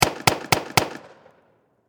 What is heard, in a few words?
Warfare Pistol 45 Magnum Four Shots Distant Perspective; Pistols